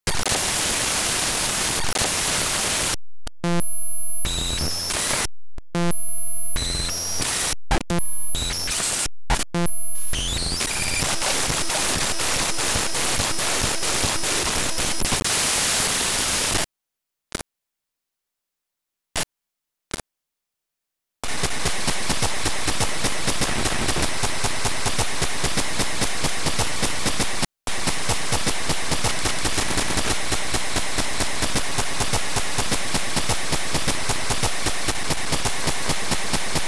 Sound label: Boot, Data Moshing Raw; 99Sounds; Mangling Audio